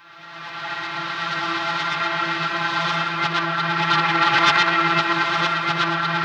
irritant, bizarre guitar; Spectrasonics

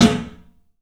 CLUB SNARE; 3D Sound; 3D Drums

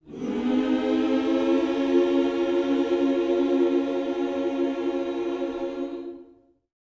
MM MINOR, Gospel

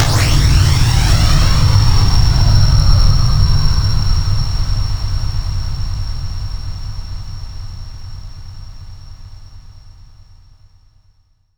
Blasting Space, FX Atmospheres; Zero-G; Soundclash Nu Dance Electro; Acidized Wavs

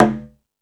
Latin Drums; E-MU Pro-Cussion

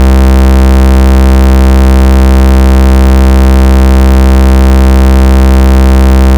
303 VCO SQR, TB-303 Synth

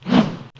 SWISH, percussive

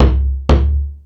Jungel Percussion, E-MU Pro-Cussion; drum machine

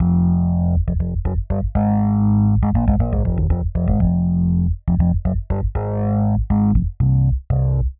Rhodes Bass F; PowerFX - Nu Jazz House

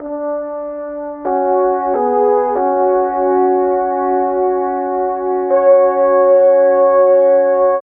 Horns; Sonic Foundry (Sony Creative Software); Cinematic Funk Urban Tone Poems

